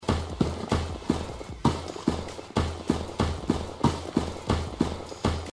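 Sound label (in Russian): shagi, footsteps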